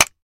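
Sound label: Mouse Trap Clear Snap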